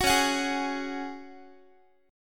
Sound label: C sharp Suspended 4th C sharp down Guitar; strummed; 21,21,21,18,x,x